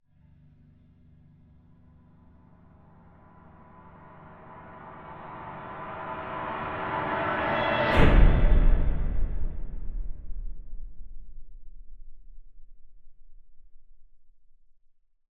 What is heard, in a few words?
Swell To Impact; bottlerocket